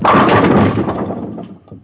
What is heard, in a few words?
bowling